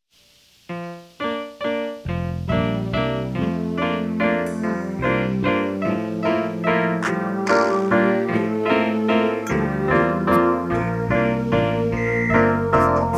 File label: computer variations lead parametric play